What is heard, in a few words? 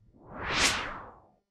soft ui hover